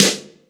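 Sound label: snare, Drums & Perc; AKAI S6000 CD-ROM - Ultimate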